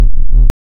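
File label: waveform FM triangle sine silence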